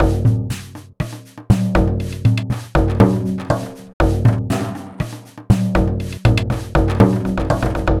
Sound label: Frame Drum Loop, Ethnic Instruments; Sonic Foundry (Sony Creative Software); The Electronic Music Manuscript Collection